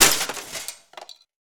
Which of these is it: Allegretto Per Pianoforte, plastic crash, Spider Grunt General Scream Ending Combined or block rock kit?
plastic crash